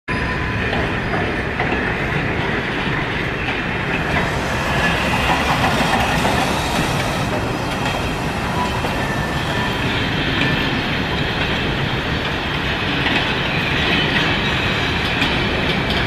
Dr14 class heavy shunter locomotive at Pasila hump yard; finnish